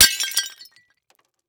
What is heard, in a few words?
Household Glass Plate Break On Debris